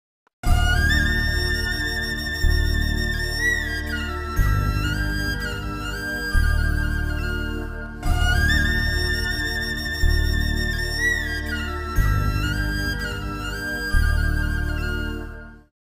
emotional tone

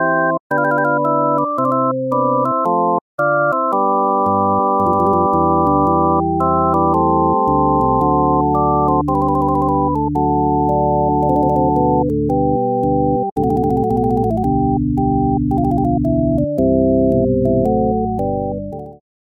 Organ; Harmodion

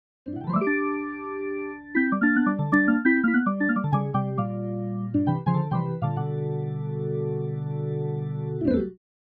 Hammond B3 Jazz B3 Slow; Master Hammond B3; Syntheway

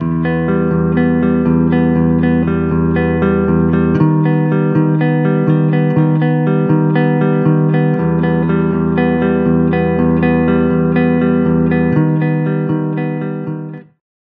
Stereo Flanger, Ultra Chorus Flow, Guitar Dry; Sonicircuit; Syntheway

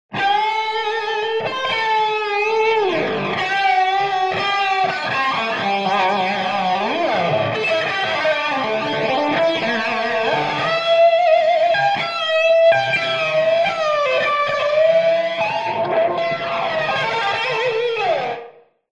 Whirling Chorus Guitar Wet; Vox Quantizer; syntheway

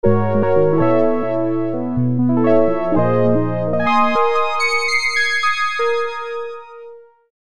Synthesizing Keys; Zephyrus; Syntheway

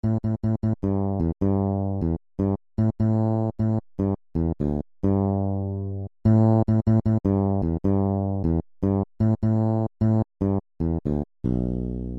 Fretless Electric Bass; Bassesland; Syntheway

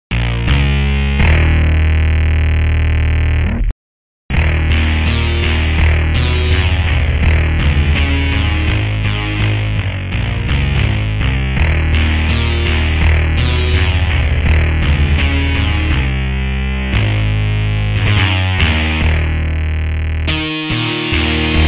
Fuzz Overdrive Electric Bass; Bassesland; Virtual Acoustic, Electric and Synth Bass KONTAKT Sample Library for NI KONTAKT; Syntheway

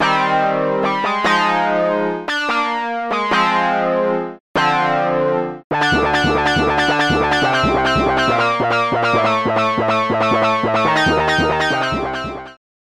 KEYS Wah Resonance; Synthelium; syntheway

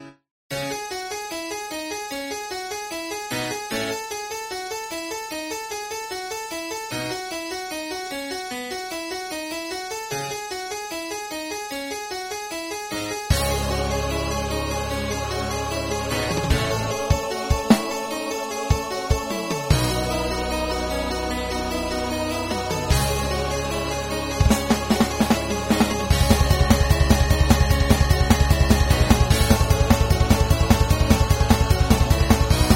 Harpsichord, Choir; Black Diamond; RetroMagix Harpsichord; Magnus Choir

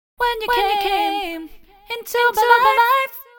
Female Vocals Wet BPM Synced Delay; 1-2T; adding a slight delay; Echo Chamber; Echo Chamber is a stereo reverb and delay plug-in; Syntheway

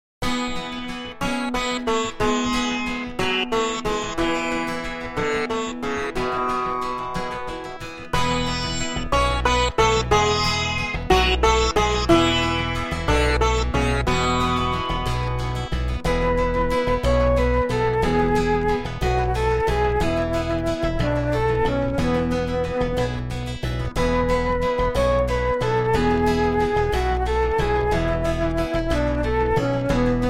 Virtual Sitar, Flute; Syntheway

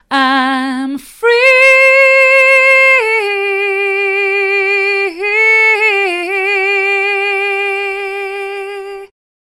Female Vocals Dry; Church Reverb; Echo Chamber; Echo Chamber is a stereo reverb and delay plug-in; Syntheway

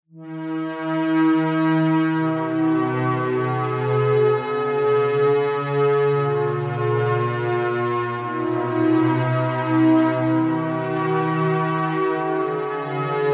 Oscillator PAD Relaxed Ambience; Alphatron; Syntheway

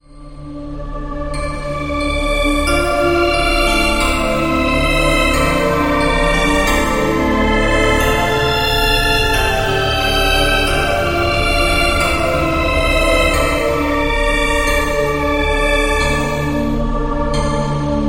Dystopian Chorus; Magnus Choir; Syntheway

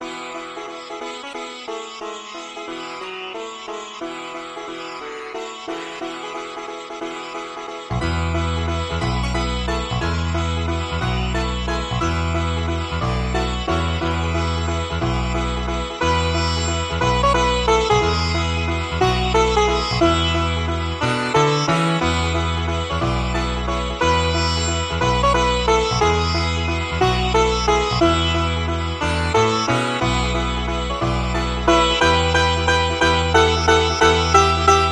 Virtual Sitar, Tabla; Dukki Tarang; Syntheway

